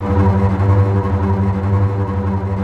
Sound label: TREMOLO G2, TREM STRINGS; Greytsounds VOL-1